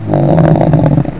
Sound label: death; vomitus